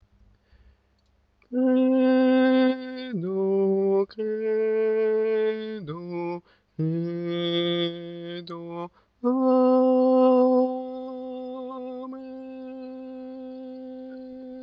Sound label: Lourdes credo ténor